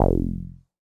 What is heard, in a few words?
bass F1, ARP Odyssey